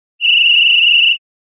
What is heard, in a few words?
whistletone